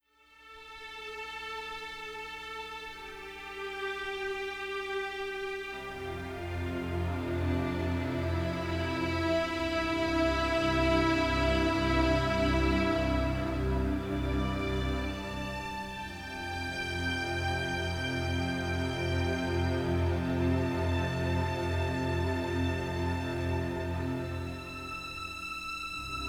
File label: sweet strings